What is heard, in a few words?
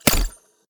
luna arrow